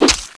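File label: knife hit body; wanted; weapons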